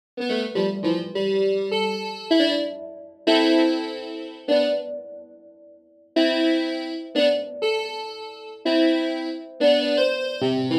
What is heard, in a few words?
Analog Piano